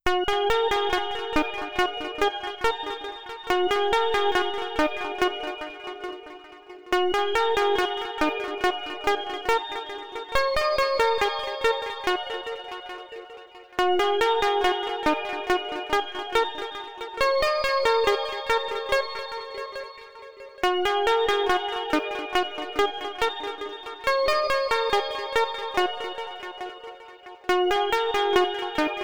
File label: fitness music for stretching, 432 hz